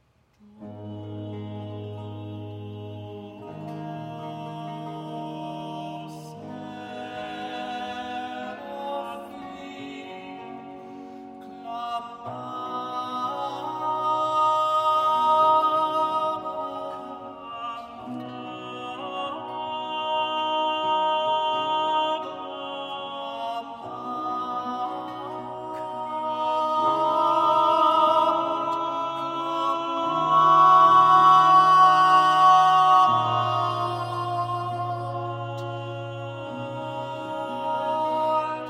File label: motet for three voices; Vespers of 1610; Duo Seraphim